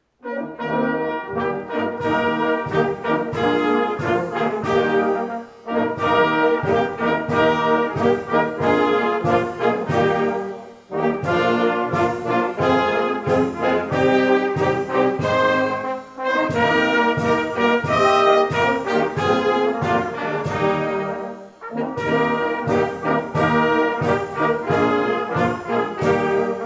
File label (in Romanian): Fanfara